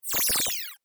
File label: Woosh dry; افکت صوتی